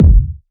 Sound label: puffy kick single shot F sharp key, Royality free kick drum sound tuned to the F# note; kick drum samples